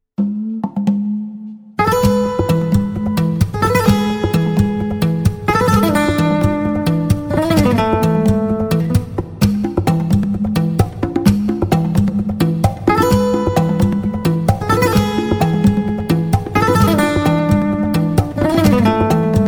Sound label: Drum Wisdom